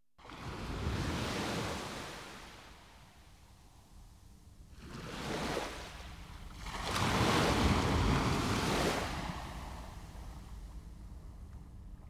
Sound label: Reiki Music, Dolphins in Music; Autotraitement; Rencontres partages Reiki